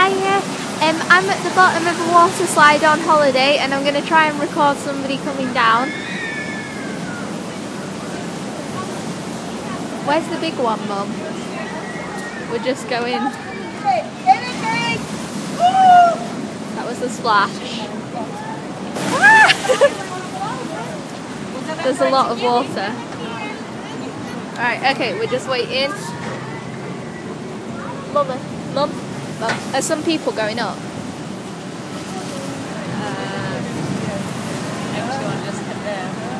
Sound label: water sliding